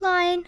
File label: lion; The Interactive Alphabet